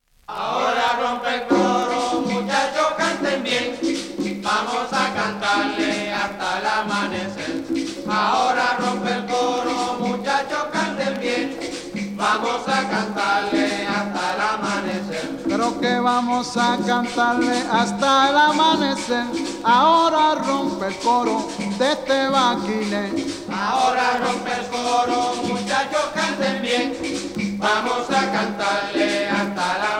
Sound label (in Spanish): canto de Baquiné